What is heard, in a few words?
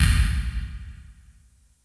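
EQ SNARE DRUM; DDD1; KPR77; Crypton - DrumBase5000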